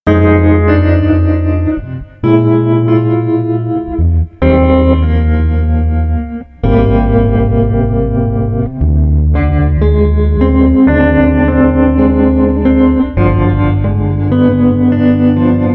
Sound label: Guitar Bass Ballad 110